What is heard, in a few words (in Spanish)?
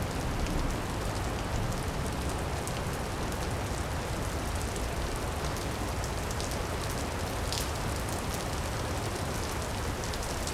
Sonidos de Lluvia y Truenos; Dormir Profundamente